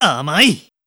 voice attack

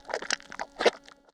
squashy sticky oneshot

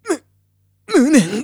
voice damage

